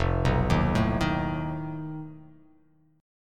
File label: F 7th Flat 5th F down